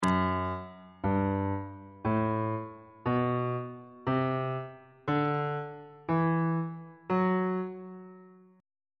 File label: f sharp locrian mode note positions on bass clef